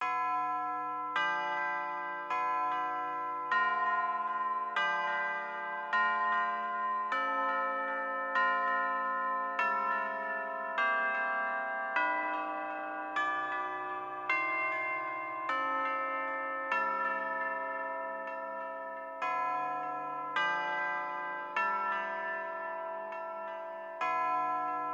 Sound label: tubular bell 100bpm C